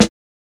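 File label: snare drum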